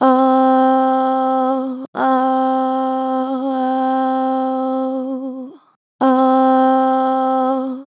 Vib Ohh, Vocals, Natural Vibrato Strong; Harmor Vocals

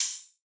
ride, cymbals, drum one shots; Rides; Cymatics; Cobra Hip Hop Sample Pack

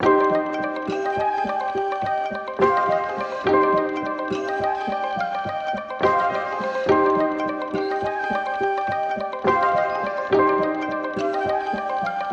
140 BPM C Min, Trap Melody Loops; Cymatics; Nightmares Trap Melody Loops

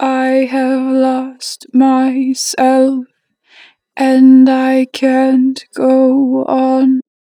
Natural Vibrato, Vocals, I have lost, 4 bar vib; Harmor Vocals

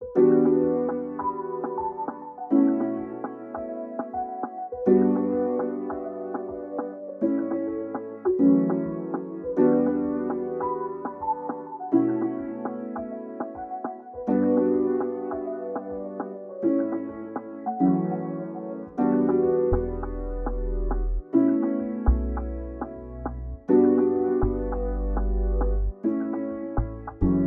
102 BPM B Min, Melody; Frustrations; Cymatics; Infinity Melody Collection

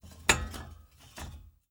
Metal Hit; Fairlight Sound Lib

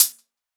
Hat, Lo-fi; Hip-Hop; One Shots; Ghosthack; Drum Mega Bundle Demo; Hip-Hop & Lofi Drums

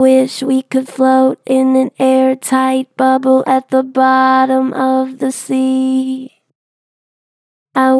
Gen Flat Wish I could float, Vocals; Harmor Vocals

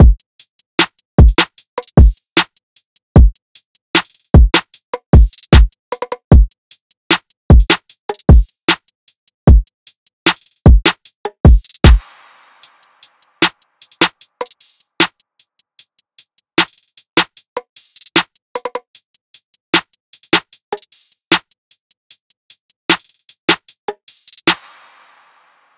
Trap Drum Loop - 152 BPM; Cymatics; Orchid Sample Pack

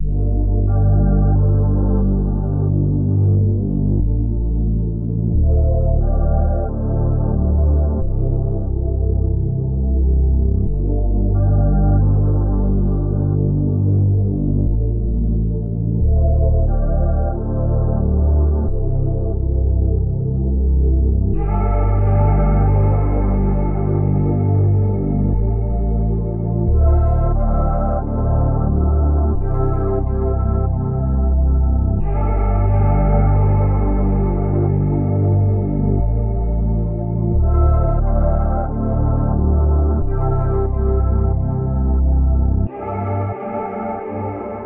90 BPM D Min, RnB; Lost Touch

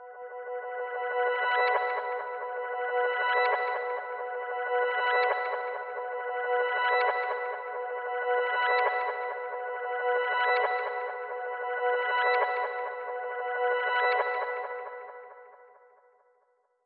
TEXTURE Ghost - 135 BPM B Min, Ear Candy; Cymatics; GHOST (Beta Pack)

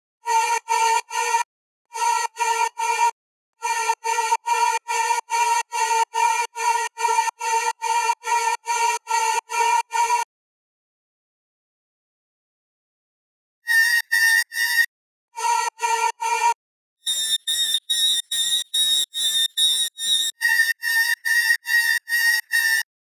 143 BPM D# Min Filler; Melody Loops; Loop Stems; Cymatics; Hyperbass Pt 2

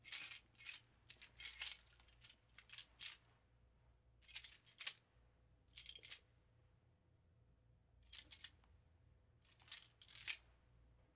Keys Rattle; Fairlight Sound Lib

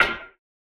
Perc - Twang; Drum One Shots

